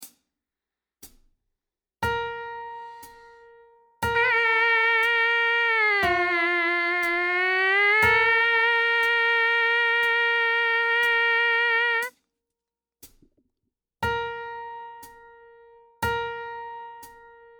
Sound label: Mpc buzz, trumpet